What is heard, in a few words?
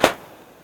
pop; World Of Goo; Fuse